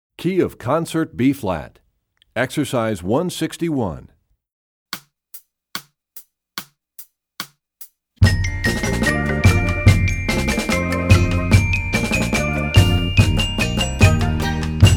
Studies Concert Bb, Percussion; Rubank; EE2000 Book2

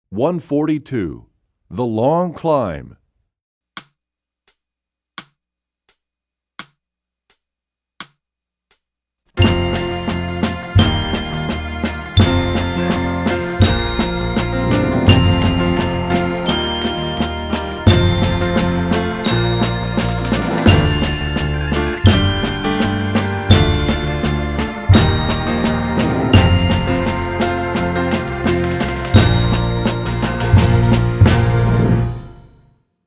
The Long Climb, Percussion; EE2000 Book2

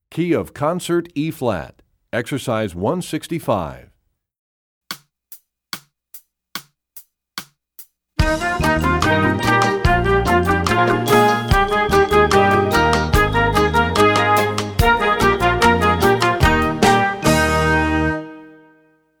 Studies Concert Eb; EE2000 Book2; Rubank

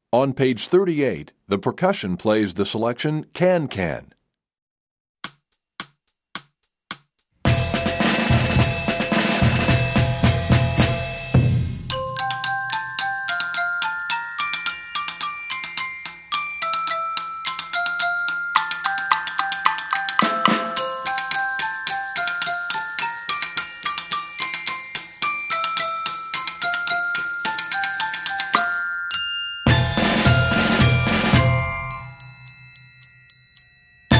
Can Can, Percussion; EE2000 Book1

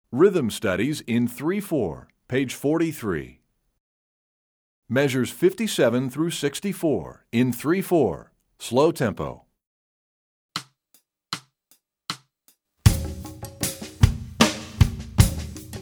Slow Three Four; EE2000 Book1

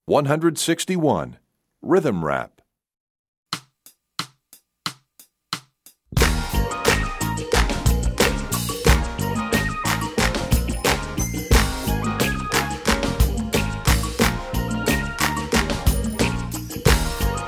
Rhythm Rap, Percussion; EE2000 Book1

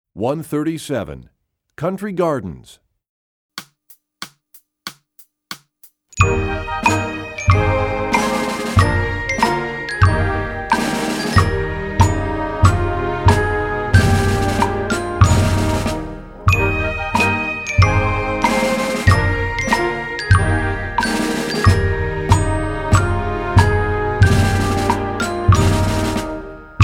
Percussion; Country Gardens; EE2000 Book2

